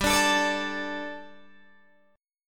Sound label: G Suspended 2nd G, down, Guitar, Open D